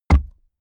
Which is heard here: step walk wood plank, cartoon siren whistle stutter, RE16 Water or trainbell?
step walk wood plank